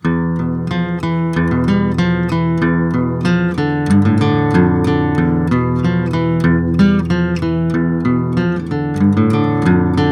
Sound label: SM58 PreAMP Drive COMP